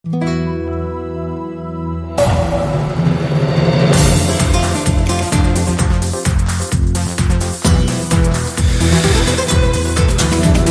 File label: Flamenco